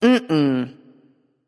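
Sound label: disagree, female; smite; voicepack; hera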